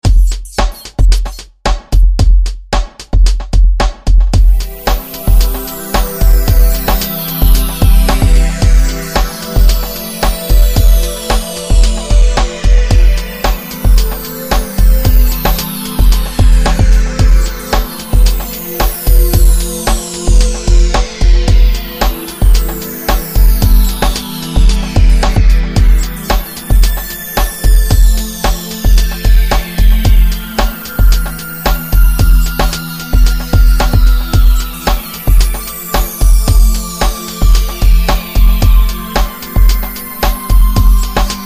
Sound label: amb